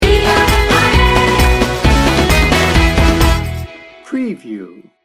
be a party sting bumper

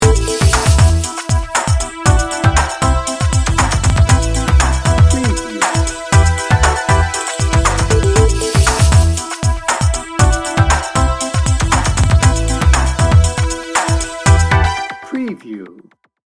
hip and groovy

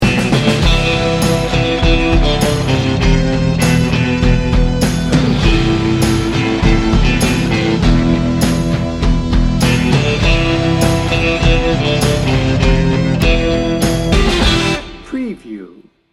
surfer down

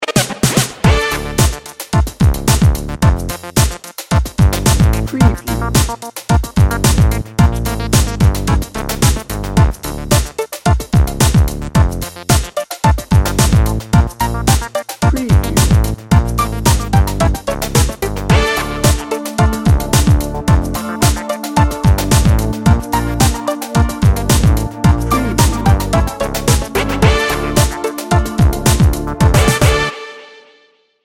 bouncy electro pop

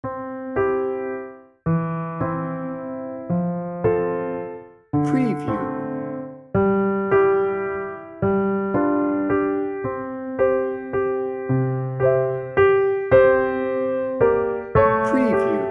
oh little town of bethlehem bed

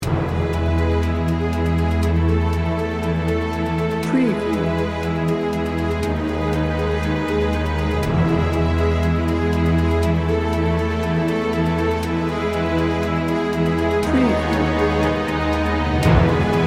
inspiring hopeful cinematic

